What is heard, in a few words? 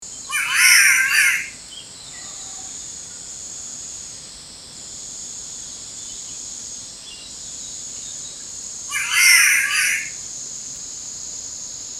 Ailuroedus Maculosus, bird species; Spotted Catbird; Ptilonorhynchidae (Bowerbirds and Catbirds)